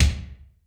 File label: kick, Percussion; realdrum